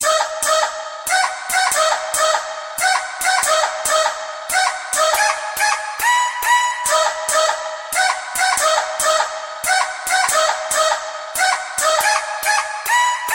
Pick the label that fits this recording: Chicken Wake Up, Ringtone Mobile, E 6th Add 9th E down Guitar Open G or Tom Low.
Chicken Wake Up